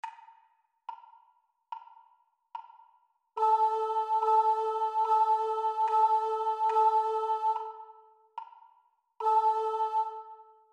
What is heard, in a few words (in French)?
Rythme Haut